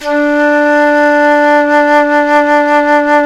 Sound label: Jazz Flute; Roland LCDP04 Orchestral Winds; 90 s Sample CDs; Jazz+Singin'